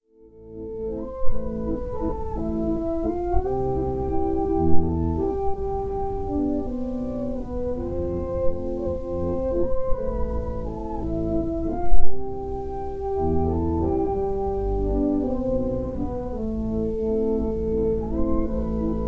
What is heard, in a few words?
Waltz; Ashland